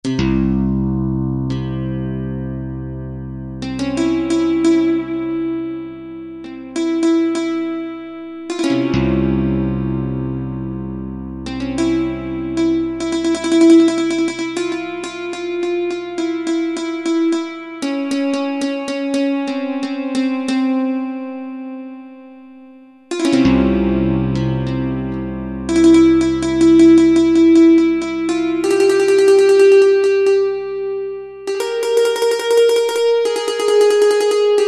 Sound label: Oud, XG Voice examples